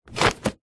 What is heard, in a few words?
household book telephone directory set down; zapsplat